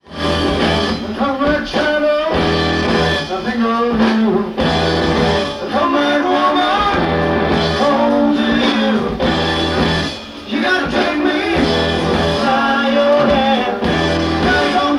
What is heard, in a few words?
scottish pipe